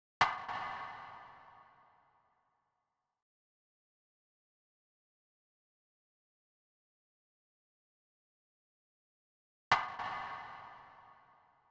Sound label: Beat Starter Perc